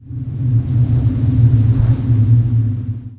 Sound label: wind snippet, ambient